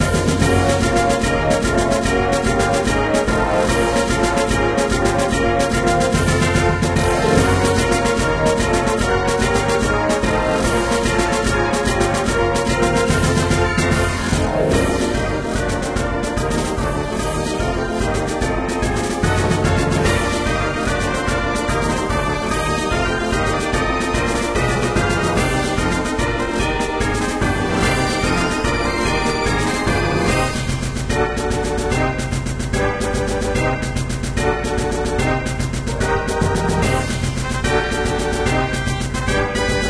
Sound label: Battle; MapleShrine